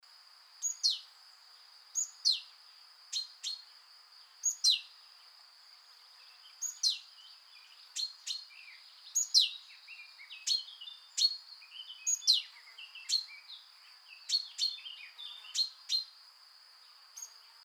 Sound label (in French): alarme et chant inquiet